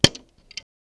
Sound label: power on; NEC PC-6001; PC6001VW3